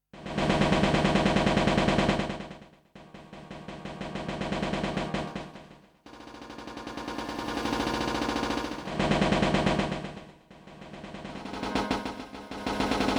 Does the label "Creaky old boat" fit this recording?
Yes